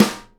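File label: snare nylon damp, Live Snares; E-MU Producer Series Vol. 8 – Platinum Phatt (CD 1)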